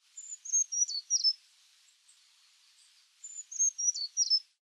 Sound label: CREEPER BROWN; species/birds; wbba